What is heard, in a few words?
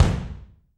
BD, Drums; Bob Clearmountain Drums I